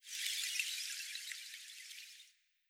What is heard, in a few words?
Pollen Spell